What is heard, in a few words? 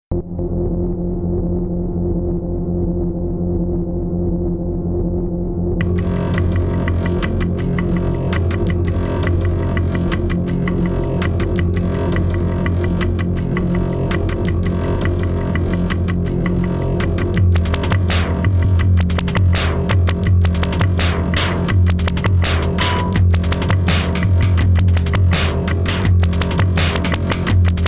drone